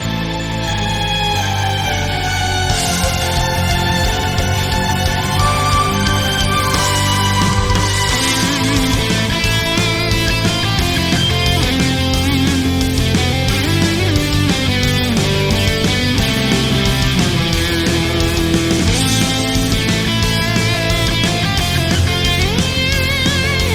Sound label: RPG Battle